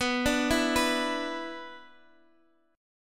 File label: B-Minor Double Flat 5th, Guitar Open D